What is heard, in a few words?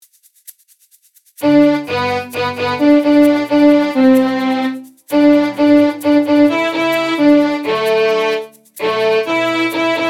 we wish; We wish you a merry Christmas